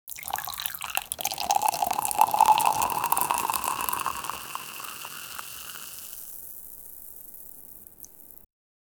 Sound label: pouring hot steamed tea o